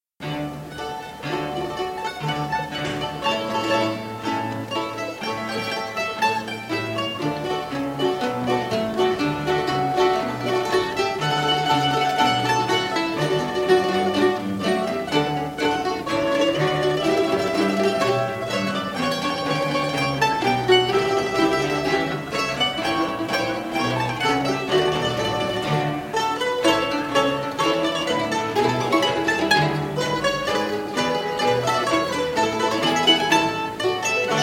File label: Italian Riflemen March, mandolin